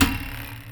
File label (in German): sprungfeder, geraeusche